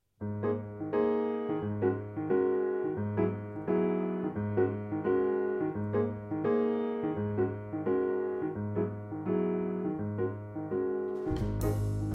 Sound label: Polonaise c sharp major